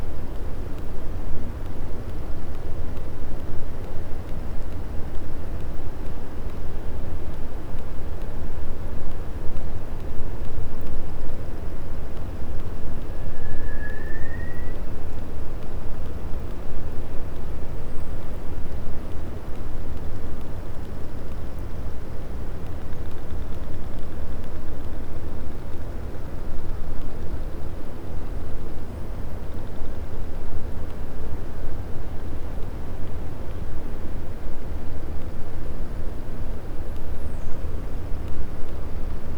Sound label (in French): cachalot positif, Physeter Macrocephalus